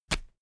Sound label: toilet paper sheet tear off fast; haus-garten